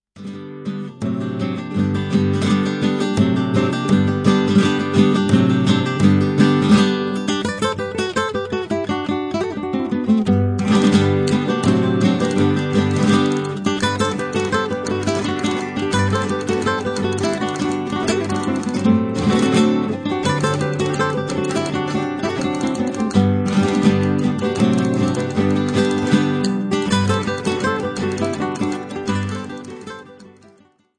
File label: gypsy rhythms